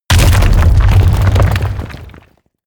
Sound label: break stone large